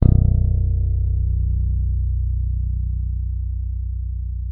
B0, FINGER MUTE; Xsample VOL-1

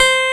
Clavinet C5; USB Soundscan vol.09; AKAI; Keyboards Old School

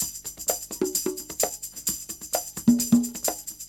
perc, 130, Percussion; Big Fish Audio - DOM

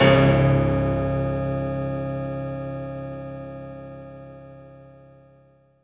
PIANO WIRE, PIANO WIRES; Spectrasonic Distorted Reality 1

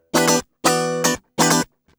GUI 120 5aE; Best Service Gigapack 2

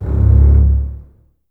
BSS C#1, String Ensembles, Basses, Detache; Miroslav Vitous; 90 s Sample CDs

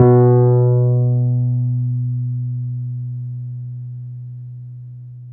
PNO, Wurly

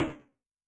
SHUTDOWN; Yamaha DTXpress-II; FX

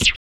RSS, 160 BPM

